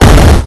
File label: 8bit explosion heavy bomb boom blast cannon retro old school classic cartoon; epic stock media